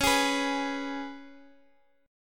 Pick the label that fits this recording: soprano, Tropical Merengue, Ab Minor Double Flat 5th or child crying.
Ab Minor Double Flat 5th